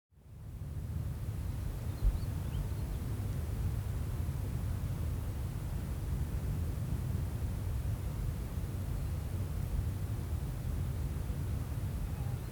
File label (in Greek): Δεξαμενές Νερού Χειμώνας; Δεξαμενές Νερού (ΗΞ); Δεξαμενές Νερού Χειμώνας (ΗΧ); Deksameni